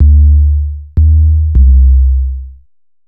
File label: Bass, Futurist Drum & Bass; Sonic Foundry (Sony Creative Software)